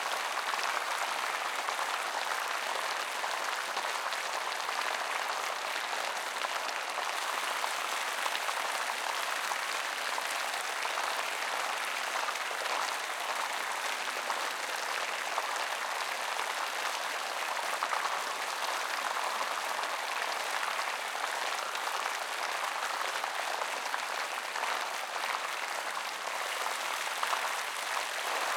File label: Amb Applause Loop